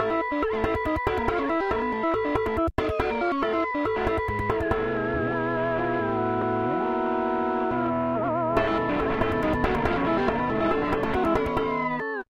robot Tune

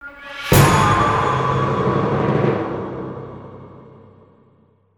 Music Stingers - Battle Stinger; conan xbox 360 gamerip 2007

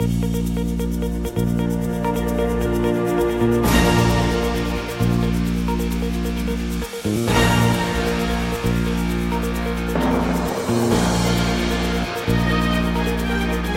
news loop; guns n glory zombies android game music

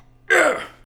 argh

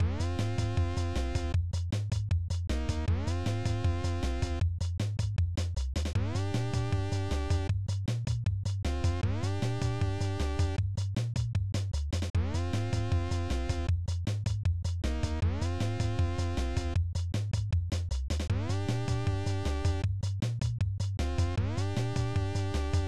Level Music; Clock Works; SNES

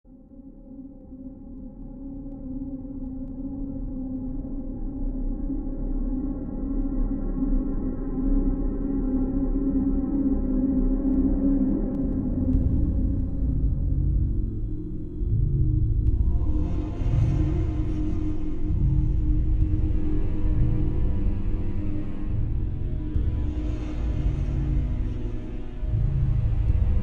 AMBIENT; F.E.A.R. Perseus Mandate

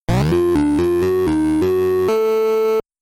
All Clear; NES Virus Cleaner

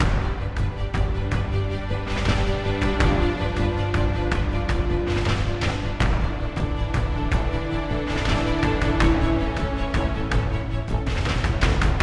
Mood Mid

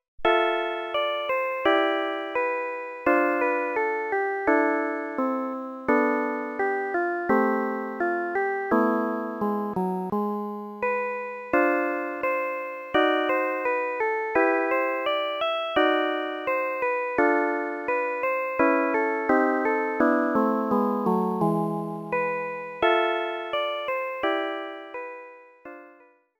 Small Shrine; dragon quest game music super collection vol.1

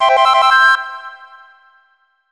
Collect Gold Feather; Banjo-Kazooie; N64

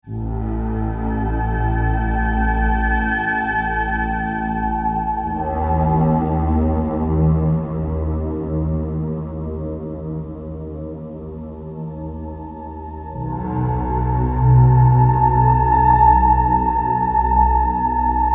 stinger soft

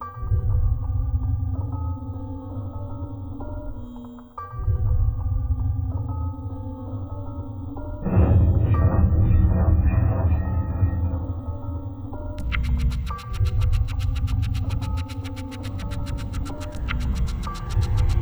Ambient Beats; Hitman Blood Money; Ambient Beats Master

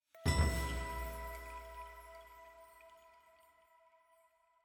item Jingle Bell chime + Perc E

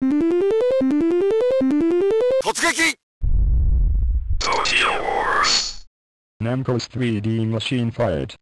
Speaker Check; namco system super 22 arcade; Tokyo Wars

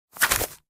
Get Gift

